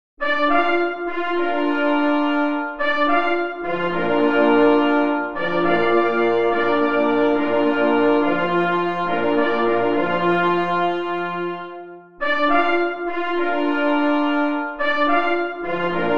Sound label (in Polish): Hejnal na 2 plesy i 2 parforsy; Hejnal Kola Lowieckiego ZURAW w Koscierzynie